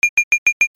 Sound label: BANGS